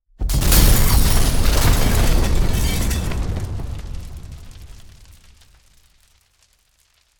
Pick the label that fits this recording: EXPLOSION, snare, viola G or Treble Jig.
EXPLOSION